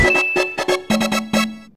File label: Sound Bomber - Win; Mario Artist; Polygon Studio; N64